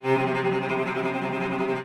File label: tremolo